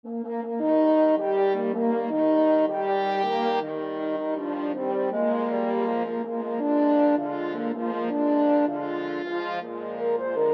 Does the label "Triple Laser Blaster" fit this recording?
No